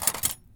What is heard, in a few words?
Silverware; BB Retail Therapy Sample Pack